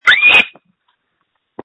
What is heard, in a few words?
screams